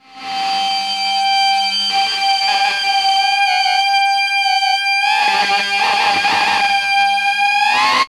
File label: Feedback, Industrial; Nasty